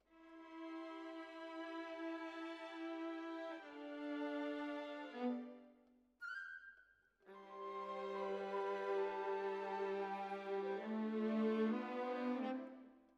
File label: Violas, jazz for piano